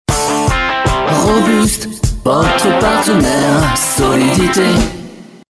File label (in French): pop rock